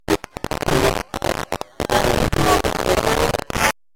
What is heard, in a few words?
robotic glitch